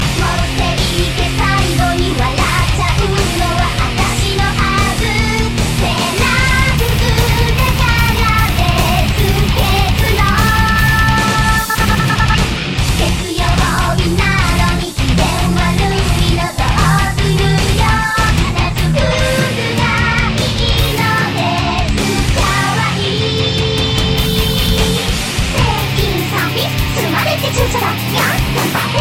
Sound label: Metal; Lucky Star